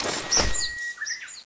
svd draw, weapons

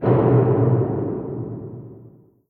headcrab canister ambient, ambience

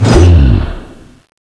landmine; fallentitan

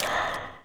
zombie ghost footstep; ghostfury

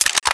aks74u clip out, weapons

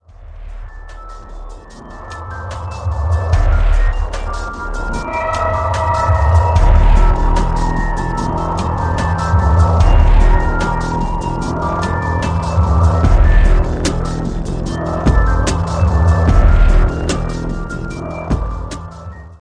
night round start; zplague

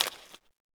zombie ghost footstep; ghostfury

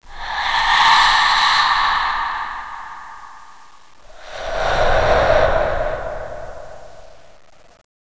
zombie ghost idle; ambience; ghostfury

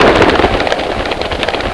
bat fly, banshee

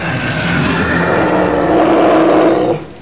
growl; The Thing

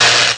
electric shock; zod

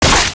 chainsaw enemy hit loud

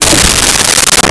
teleport off

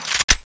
reload clip in, weapons; thanatos1

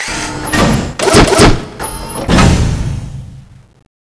attack; mad machine

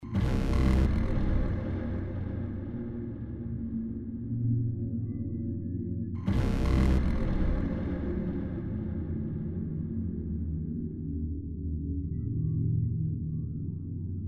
ambience; zombie evolution

